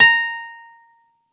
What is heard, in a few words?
A#5, Campfire Nylon guitar; Worra's Campfire Nylon